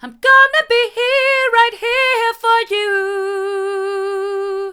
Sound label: hard, vocal